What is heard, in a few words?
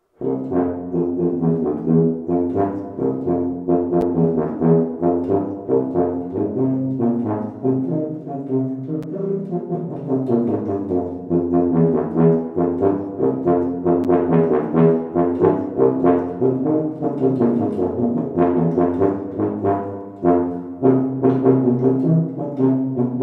Tuba Solo